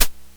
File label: hh shake; opl3; M8